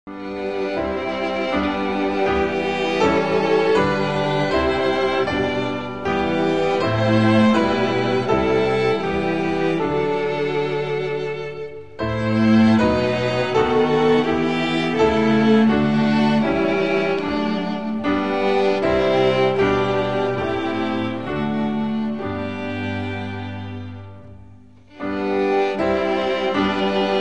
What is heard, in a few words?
instrumental; hymns spanish